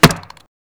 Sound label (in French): impact flèche